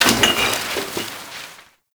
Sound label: Garbage Bag Destroy; Warframe; Ambience; NineteenNinetyNine